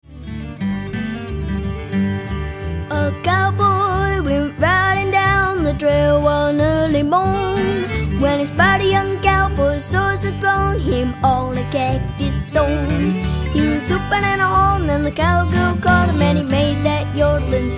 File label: Cowboy Yodel